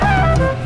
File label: flutes